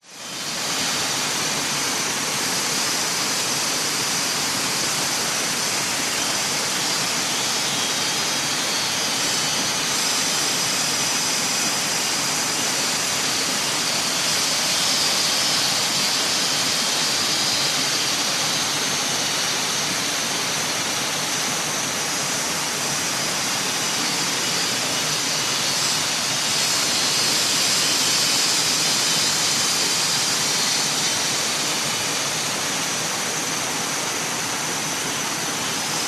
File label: doi suthep national park daytime cicada river flow distance